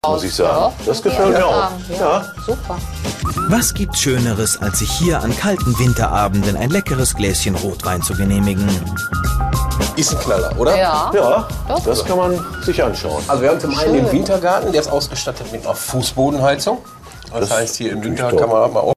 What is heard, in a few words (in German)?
musik